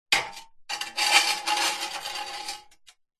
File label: industrial sack truck trolley metal small movement scrape concrete